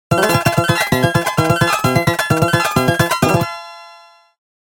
wrong backing

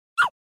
oof

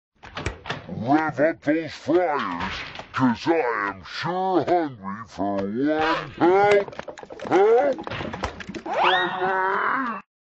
fryers

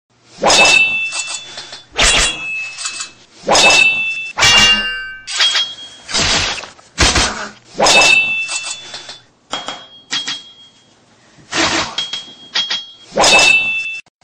sword

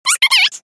clock

